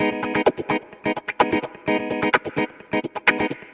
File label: Electric Guitar Kit G# min, 128BPM; Vengeance Samples; Special Sounds